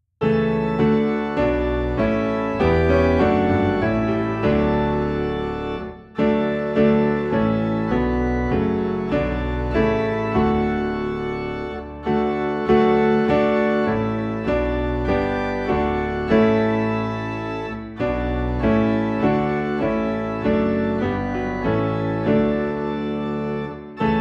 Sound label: Piano and Organ Low in D